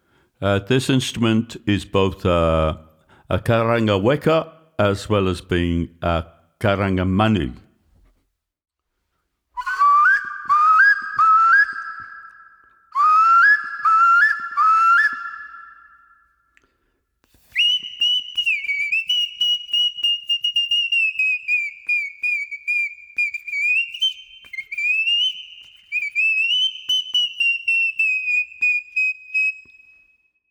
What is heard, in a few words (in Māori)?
Karanga Weka Manu